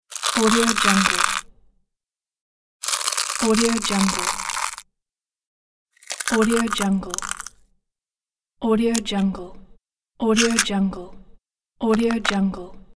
crack

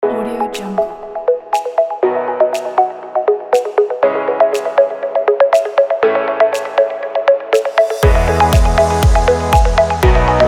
summer pop dance party

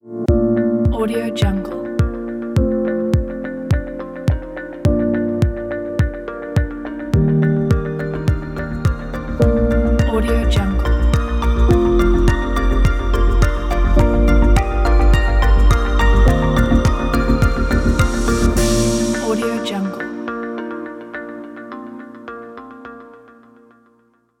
technology ambient ident

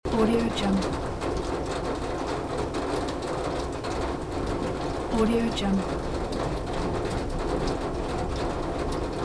rain loop; sviridpavlov

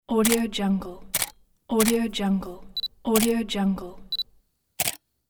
camera shutter

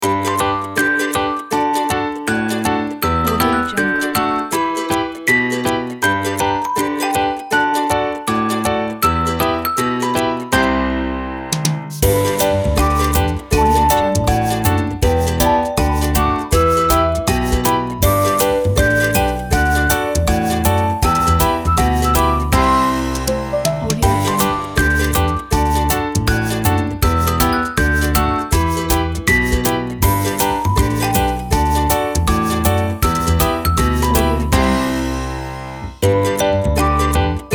short happiness song

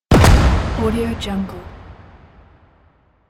cinematic impact

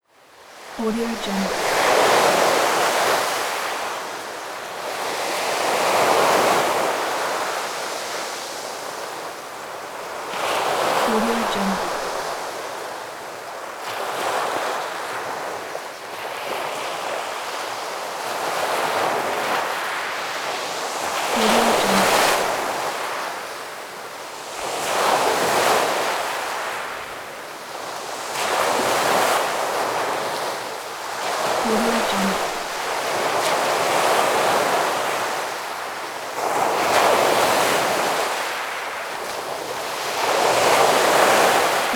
sea beach waves

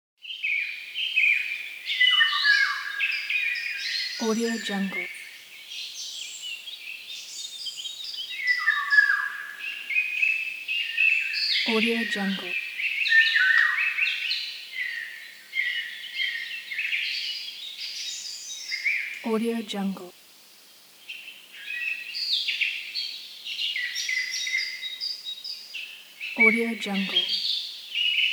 forest ambience

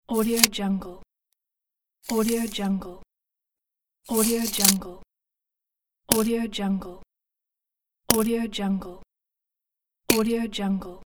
metal tape measure ruler pack

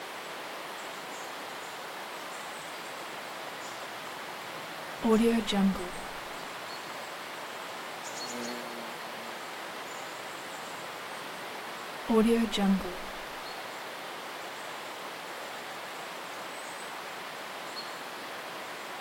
woodland bird songs morning slovenia loop

